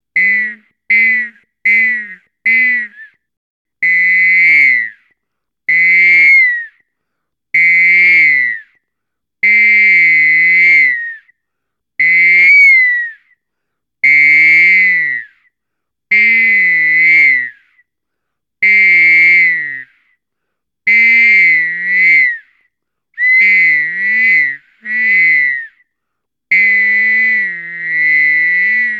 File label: solo